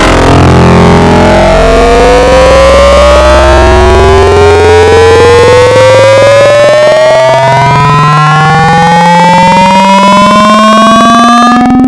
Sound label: Tubstitute Screecher; Cymatics; Wavetables Collection Vol.2; Serum